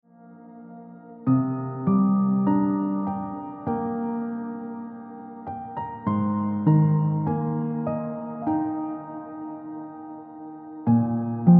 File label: Piano Music